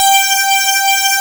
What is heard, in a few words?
THICK SYNT G4; AKAI S-Series CD-ROM Sound Library VOL-8; S-PAD